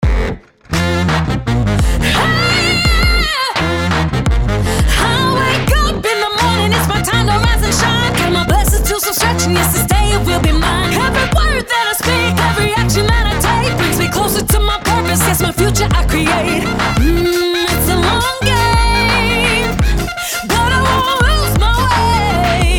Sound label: The Affirmation Song